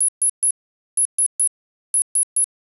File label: Temperature Warning; HTC 10